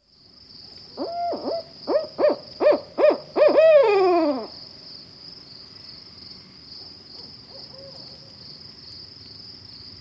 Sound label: barred owl; owls